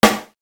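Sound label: coin drop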